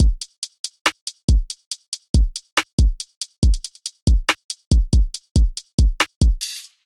Kit, Trap Drums; Seclude Kit; Ableton; Factory Packs; Trap Drums by Sound Oracle

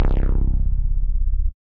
Gonzo Bass; Synth

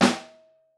Room, Snare; Ableton Foundation FreePacks; Snare Designer

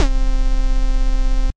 Drone Bass, Synth Bass; Ableton; Factory Packs; Beat Tools

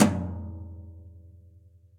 Tom Brush Floor Brush Sizzle; Drum Hits; Ableton; Factory Packs; Session Drums Club; MPE Hits

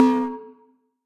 Leatherbell, percussive; Ableton; Factory Packs; Punch and Tilt

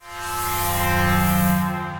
Noisy Pad; Ambient & Evolving; Ableton; Glitch and Wash

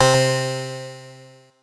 Hopsichord, Retro Synths; CZ Hopsichord; Ableton; Phazeform Vol 1

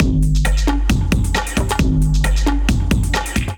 Kit Melodic 134 bpm, Drums, Complex; MIDI Clips; Bark Park Kit; Ableton; Factory Packs; Skitter and Step

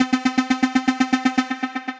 Poly Arp; Synth Rhythmic; Euphoric; Ableton; Factory Packs; Build and Drop

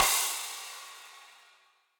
Crash Warm And Low, Cymbal; Drums; Drum Hits; Standard Hits; Ableton; Factory Packs; Session Drums Club

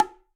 Bongo Open, latin percussion; Bongo B H Open 3F; Drums; Drum Hits; Ableton; Factory Packs

